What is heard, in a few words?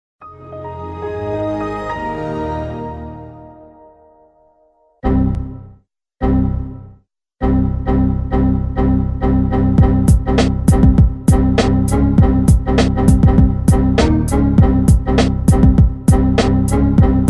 windows xp error remix